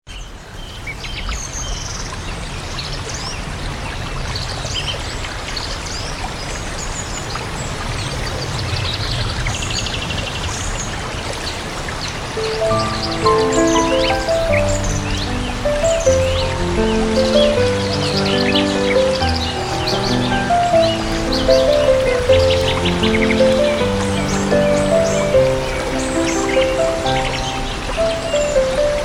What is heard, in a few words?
Piano